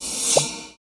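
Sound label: Ambience Partymode Crowd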